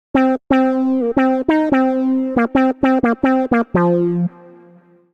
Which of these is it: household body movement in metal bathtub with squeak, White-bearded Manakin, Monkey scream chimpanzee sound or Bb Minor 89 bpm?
Bb Minor 89 bpm